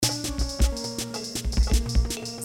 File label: Bubbling Vomit